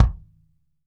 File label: DRY, kick drum; Sampleheads; New York City Drumworks VOL-1